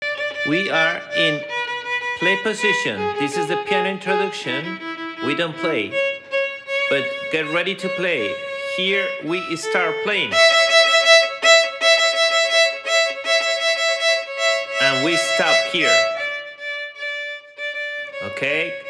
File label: Twinkle bells, violin